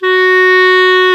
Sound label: CL F#3, Clarinet; Sound & Vision - Gigapack I CD 2 (Roland); Brass&Woods1